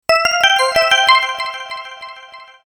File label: Sms notification